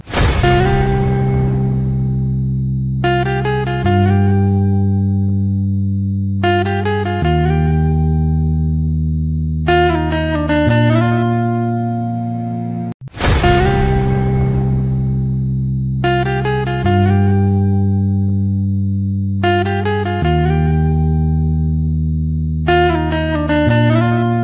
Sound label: ringtone guitar